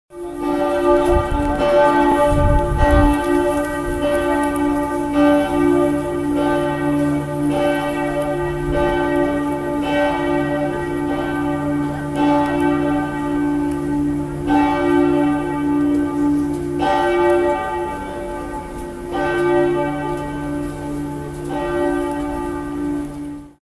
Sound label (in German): Glocken Frauenkirche